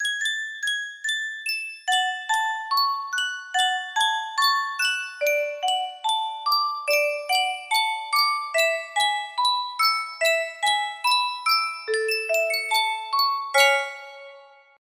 Yunsheng music box

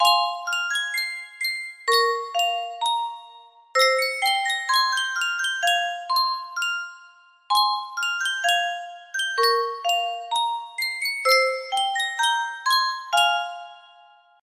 sankyo music box, melody

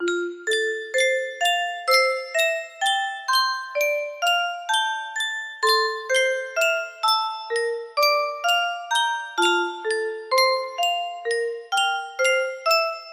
Sankyo music box, music box melody

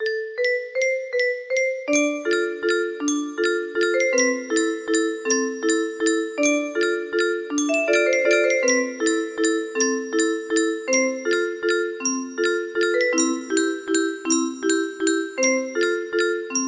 Vals, music box melody

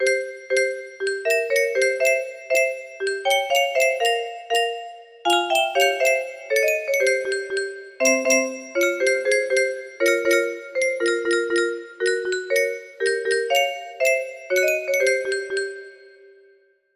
melody

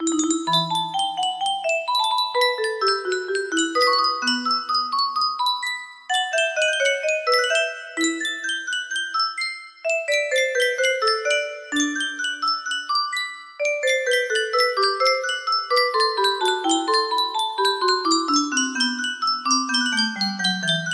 E minor, music box melody; bach invention no 7 in e minor bwv 778

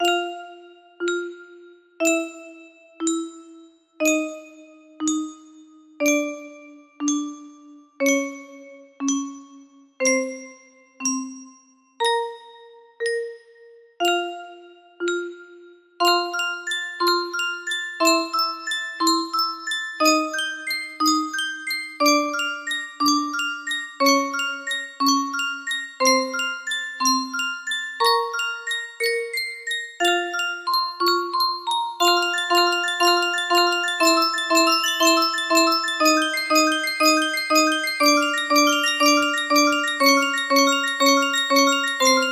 song, music box

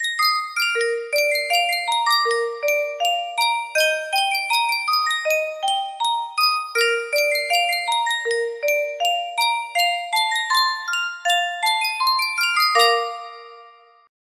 music box; yunsheng custom tune

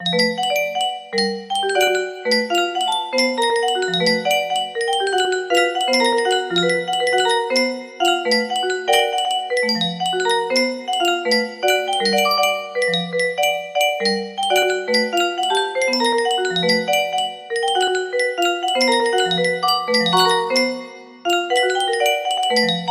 music

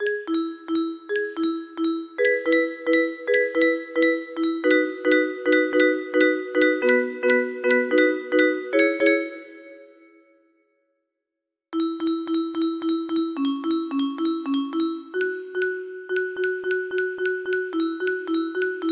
3 part harmonies, music box melody; mostly 3 part harmonies

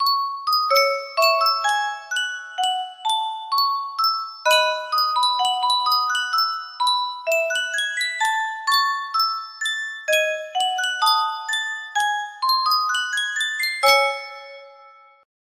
yunsheng custom tune music box

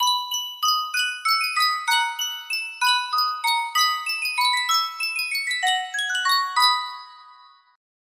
sankyo miniature music box

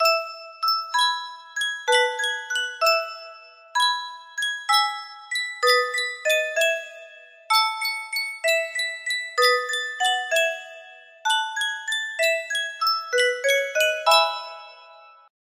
yunsheng music box, music box melody

